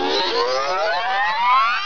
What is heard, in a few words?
sci fi takeoff; spaceshooter